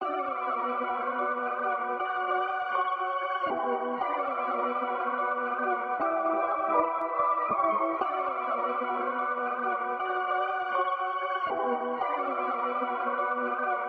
120 BPM B Min Pad, Trap; Melodies; Bazaar

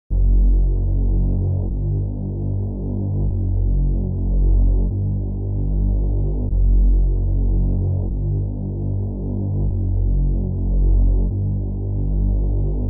150 BPM B Min Bass; Melody; Reverse; Loop Stems; Cymatics; 2022 Melody Collection - Reverse; Trend

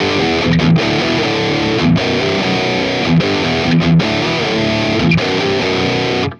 Metal Guitar Loop, 150 BPM E Maj; Cymatics; Vibrations Guitar Sample Pack

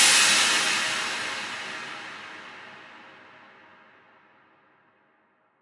Hat Crash; Psytrance; FX

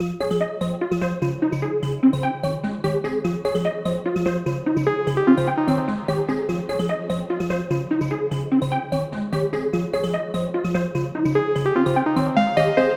148 BPM F Maj; Gems Vol 5; Astro; Cymatics; Gems Free Teaser Pack

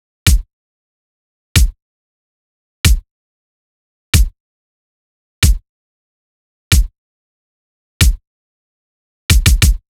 Drum Loop - 93 BPM Kick, Latin; Loop Stems; Cymatics; PARADOX II - Drum Loop Collection; Jammed

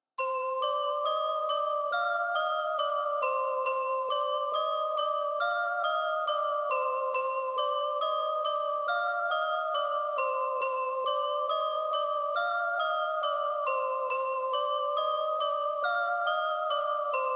138 BPM C Min Bell, melody loop stem; Trap; Loop Stems; Cymatics; 2022 Melody Collection; Stick Up

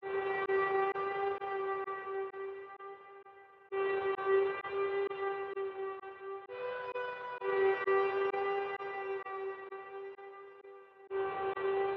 130 BPM D# Min Pad, Loop Stems; Melodies; Cymatics; 2022 Melody Mystery Expansion; Good And Evil

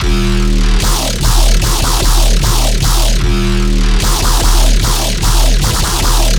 Bass Loop D# 150BPM; Dubstep; Arcana; Ghosthack - Advent Calendar 2022 - Day 18 - Bass Loops

